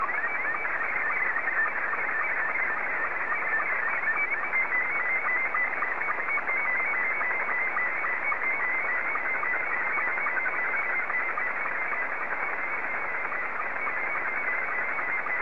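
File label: SSTV, Scottie 2; JA3QJQ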